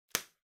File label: Bubble Wrap (Pop Distant); 気泡緩衝材; プチッ 遠い; 梱包材